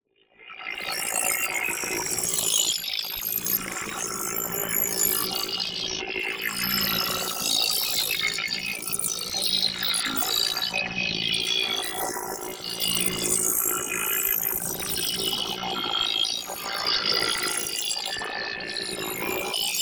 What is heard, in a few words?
MOVEMENT Cluster Grain; NEON; boom library